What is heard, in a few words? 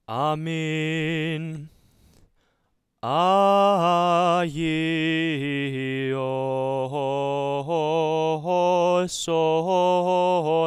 Memorial Church Close Mic